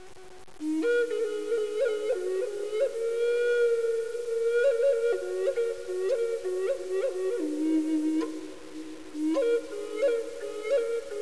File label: the flute